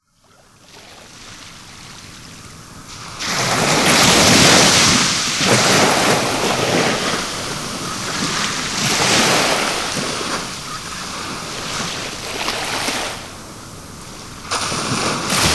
Nature Sounds, Sounds from the Seas and the Oceans - Sounds from the Sea Backwash; Nature Chill Out 2014